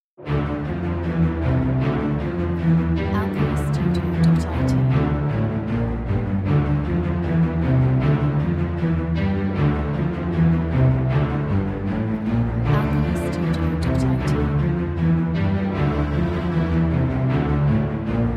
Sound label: The epic trailer Orchestra; DarkTonic; MasterAudio